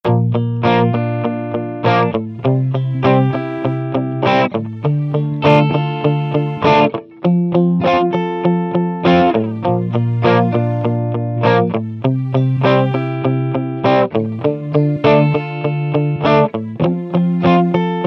elektro guitar temple tech

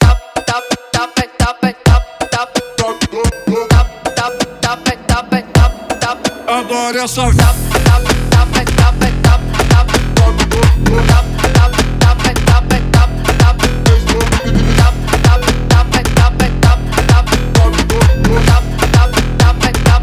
brazilian phonk tiktok mix

